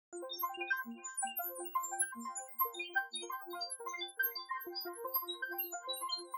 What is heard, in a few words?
instant electronica